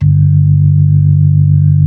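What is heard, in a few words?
B-3, club chorus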